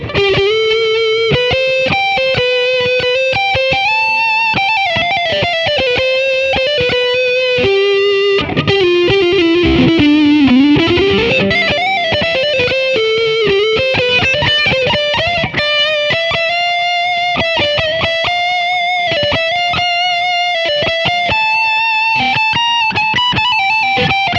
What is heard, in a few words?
spin all lead; podxt